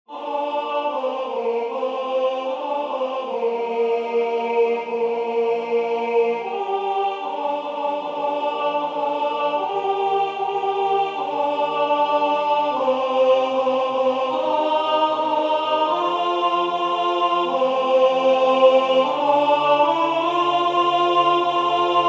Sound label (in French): O vrai Corps de Jesus alto; Du Sejour